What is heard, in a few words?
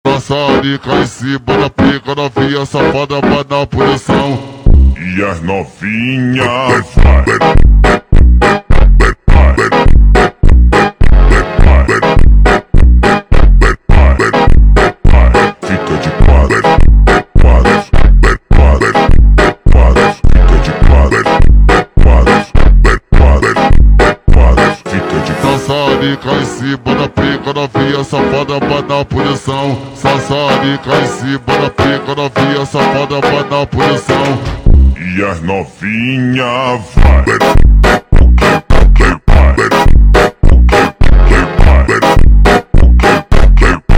Montagem super Slowed